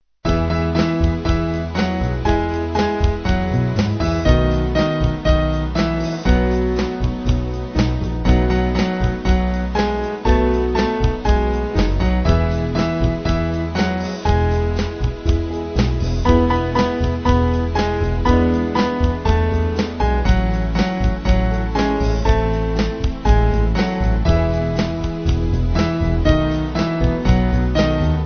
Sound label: Band